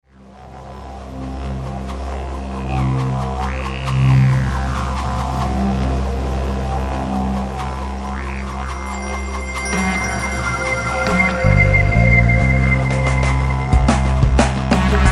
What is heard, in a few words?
didge vibes; awi